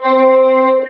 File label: talk box choir oo